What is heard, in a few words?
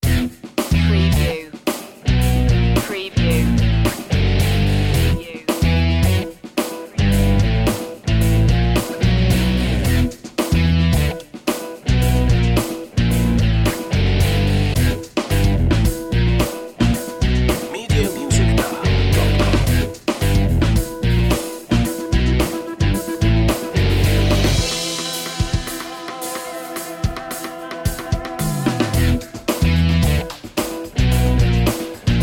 guitar fusion